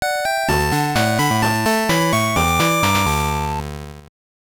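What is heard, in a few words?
Jingle, Super Game Boy; Chibi Maruko-chan: Maruko Deluxe Gekijou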